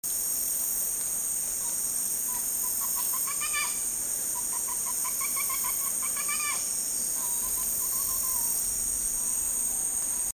Frustration Scream

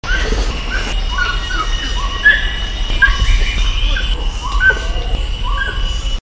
Mating Squeals

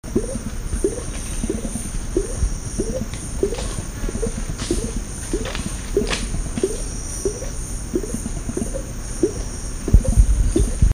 Chomping

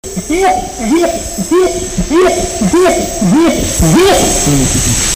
Fast Long call

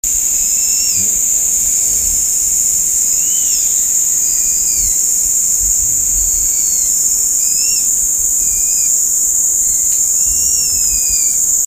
Soft Hoot and Whimper

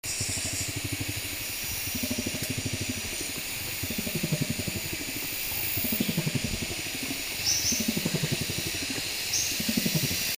Grumble

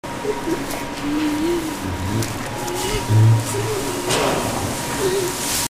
Whine with chokings of air

